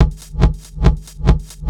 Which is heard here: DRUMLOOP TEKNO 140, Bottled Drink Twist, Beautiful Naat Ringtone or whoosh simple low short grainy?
DRUMLOOP TEKNO 140